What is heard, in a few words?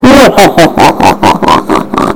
muhehehehehehehe